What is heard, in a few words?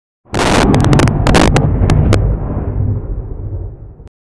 explosion earrape sound effect